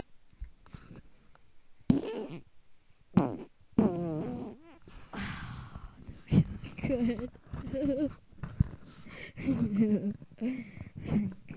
fart

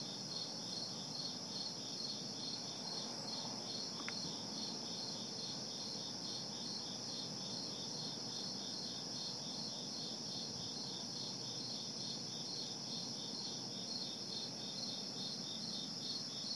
cricket

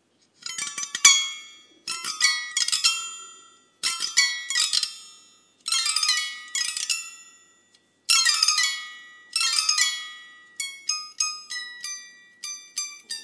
passover sounds

